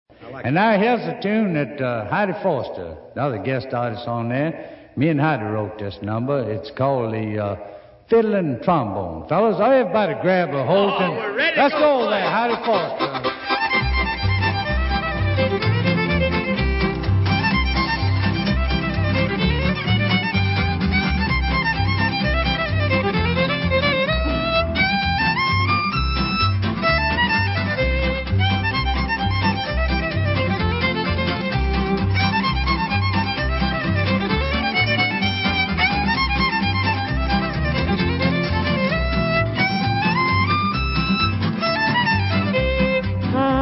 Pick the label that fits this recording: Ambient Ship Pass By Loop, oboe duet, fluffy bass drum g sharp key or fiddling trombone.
fiddling trombone